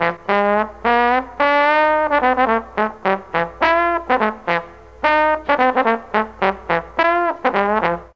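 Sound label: A3 D BONE, mellotron trombone; DIXIE+TRMBN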